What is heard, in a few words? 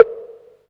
Wood Block Vinyl; One Shots; Drums